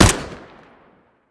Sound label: ump45 fire; weapons; covert